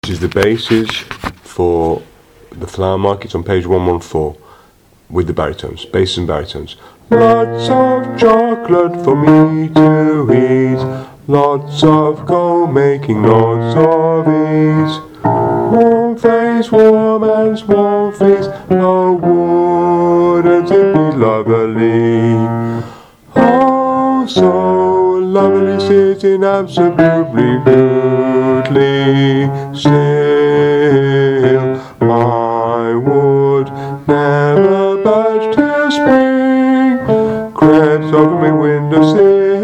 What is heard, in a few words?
bass or baritone; My Fair Lady